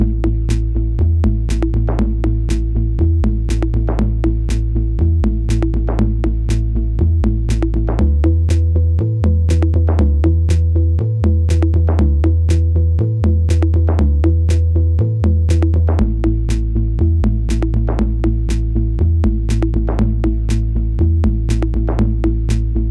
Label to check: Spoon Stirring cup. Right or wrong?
Wrong